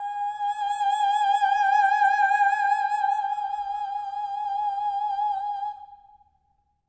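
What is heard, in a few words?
Soprano Oh G#5, Voices; Spectrasonics; Symphony of Voices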